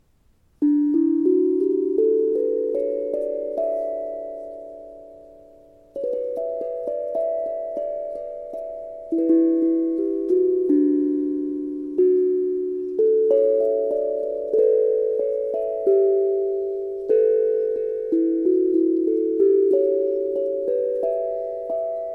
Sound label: Re major Nat 440